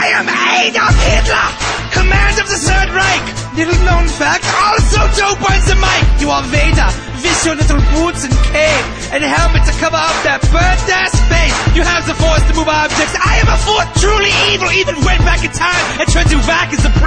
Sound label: epic rap battle; adolf hitler vs darth vader